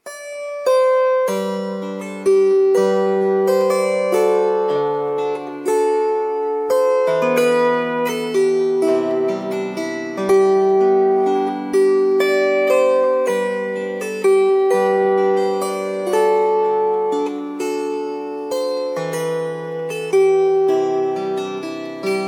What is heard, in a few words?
41 string